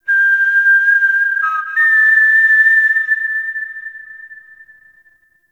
Puzzle Beacon; Warframe; Ambience; Duviri; Bombastine Encounter

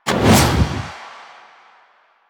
Scythe Whoosh; Weapons; Stalker; Warframe

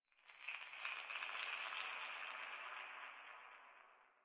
Small Bell Jingle; Warframe; Ambience; Clan Dojo; Dojo Randoms

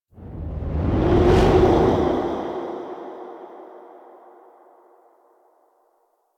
Transition Whoosh Exit; Warframe; Quests; Sacrifice

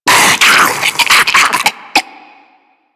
Infested Diseased Ancient Charge; Warframe

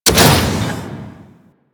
Forest Piston; Ambience; Props; Warframe; Grineer Forest

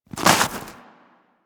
Armadillo Move Snow; Warframe; Hunting Critters; Foley

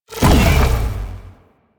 Companion Stomp Burst; Warframe; Pets; Zanuka; Abilities

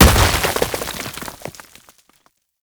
Scan Pillar Plant Debris; Warframe; Frumentarius; Scan Ability

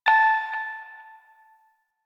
Hack Minigame Touch Node

